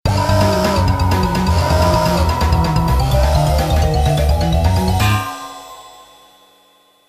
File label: Music Hurryup; Bubble Bobble Evolution; PSP